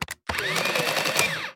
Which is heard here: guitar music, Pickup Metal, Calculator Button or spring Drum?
Calculator Button